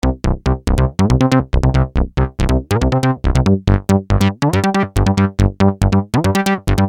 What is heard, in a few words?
Syn-Bass m C, Techno Synth Loops, 140bpm; Sonic Foundry (Sony Creative Software); Signals I; SynBs